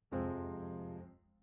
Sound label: Piano